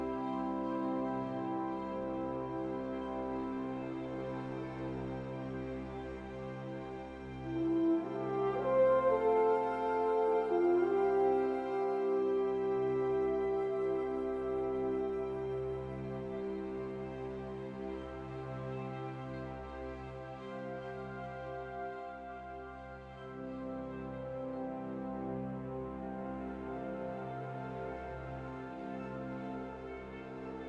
Loop; Europe1850

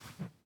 tools sledge

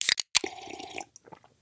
tools steroid

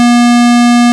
Error; Super Breakout

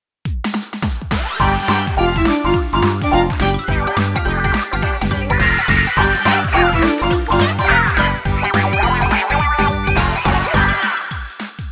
Victory!; SpringFest, Phase 2

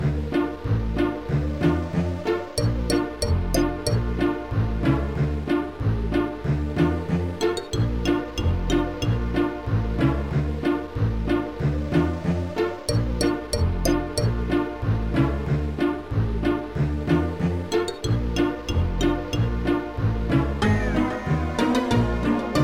Haunted Level; 3D Maze Man Amazing Adventures

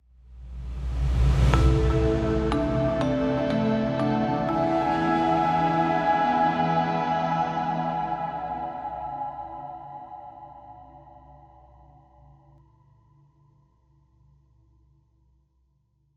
Desert Music Melody Hermit Song Outro

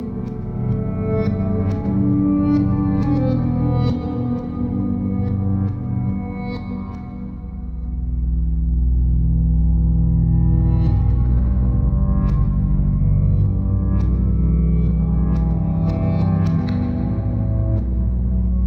guitar solo; spirits; WrestleQuest

